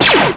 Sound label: blaster